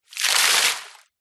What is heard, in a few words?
nature water throw from plastic bucket onto deep water surface splash